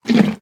horse eat; Minecraft

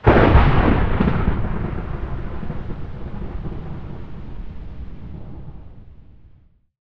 thunder, weather; ambient; Minecraft

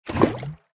bucket fill lava; Minecraft

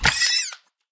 land death, guardian; mob; Minecraft

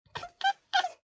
beg, cat; mob; minecraft

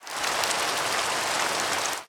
rain, weather; ambient; Minecraft

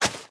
shovel flatten; Minecraft

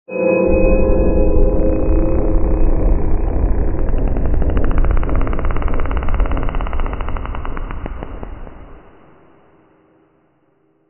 cave, ambient; Minecraft

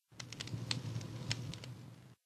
crackle, campfire; minecraft; block

